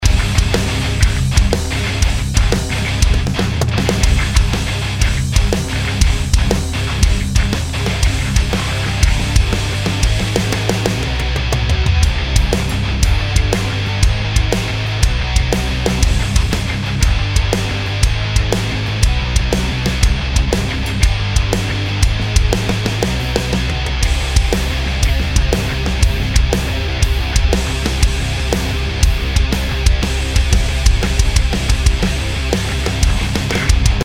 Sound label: metal kick samples